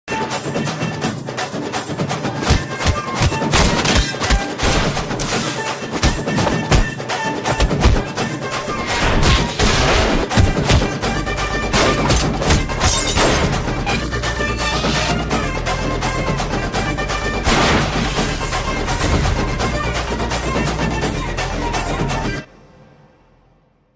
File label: remix bgm; Prasanth